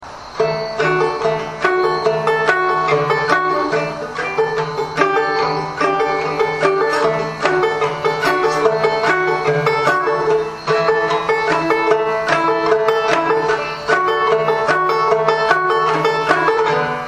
easy chord, banjo

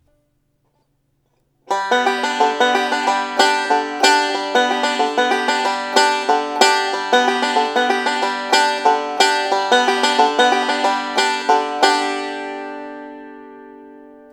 strings be, banjo

